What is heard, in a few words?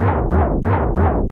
Barrel (rolling)